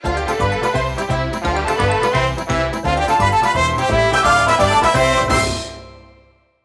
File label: Stage Clear Dance; Kirby Star Allies